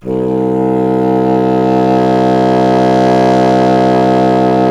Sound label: C. Bassoon, solo instruments